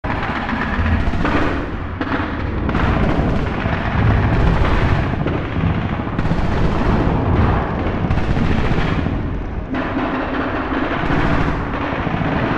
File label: Amb Battle Int